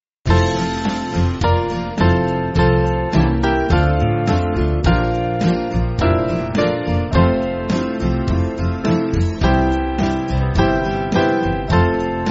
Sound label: Band, Music Backing Files for Churches; Purer In Heart; Filmore